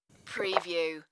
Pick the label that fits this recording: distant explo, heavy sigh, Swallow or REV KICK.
Swallow